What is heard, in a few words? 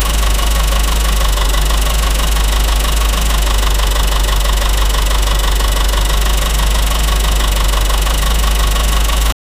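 gasoline engine idle; 1950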